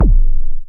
abstract kick

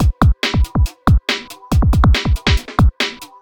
compressed 140, loops

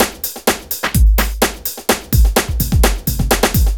funky breakbeat

